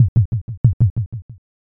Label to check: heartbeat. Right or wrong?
Right